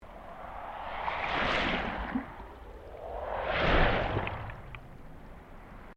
Underwater Wave Medium, Waves recorded underwater on Queensland's Sunshine coast.; Water Sound Effects; Nature